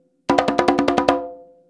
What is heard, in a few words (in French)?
frise, congas